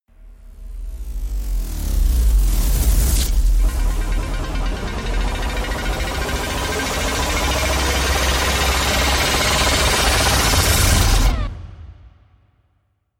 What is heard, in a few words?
rise long computational